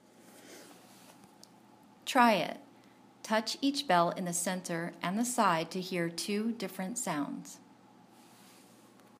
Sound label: chinese bronze bells intro try it; VMFA